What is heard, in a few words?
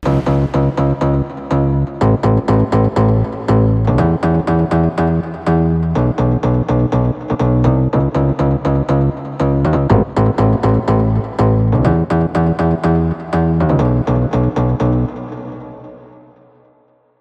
BASS DEEP